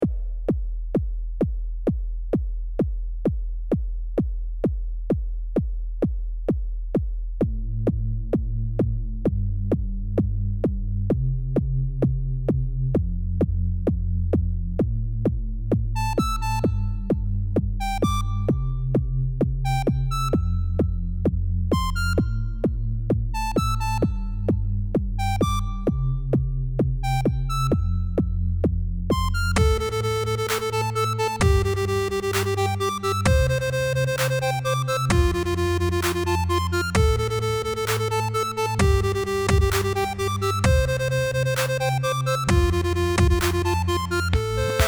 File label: techno